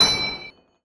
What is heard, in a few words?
coin; svencoop; zebrahub